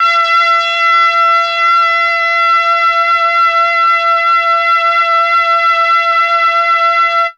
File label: MEL BRASS; ILIO; Propeller Island; Legendary M400